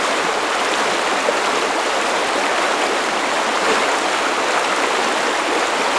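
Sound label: water river; The Elder Scrolls III - Morrowind; Morrowind GOTY GOG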